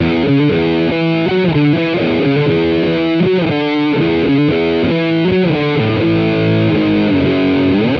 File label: guitar ankle biter, funky drum loops